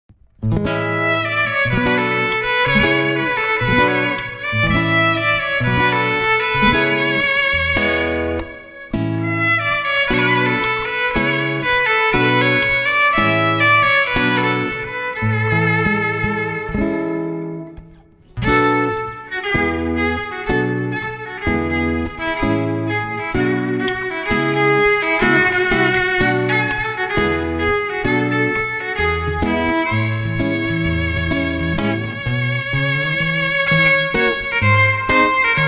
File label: Violin Guitar; Acoustic Duo